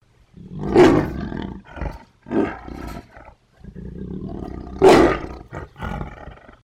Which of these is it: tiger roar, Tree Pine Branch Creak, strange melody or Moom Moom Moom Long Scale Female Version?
tiger roar